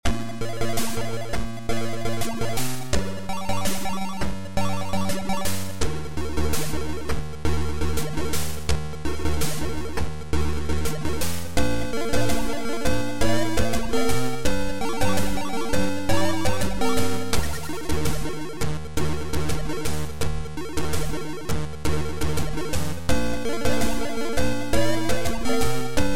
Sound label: dissonance; zxtune